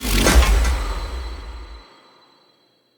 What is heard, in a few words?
Armour Plating Impact; Powers; Bromius